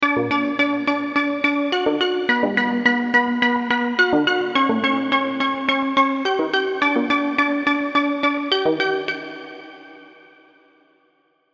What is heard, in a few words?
serum seqs arp; chiqui audio